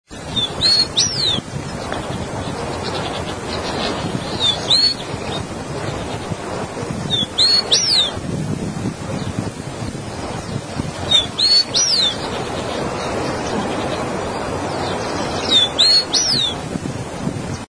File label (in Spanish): voz chingolo